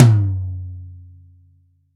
Hi Tome Drum Sample F Key, Royality free tom single hit tuned to the F note; tom drum samples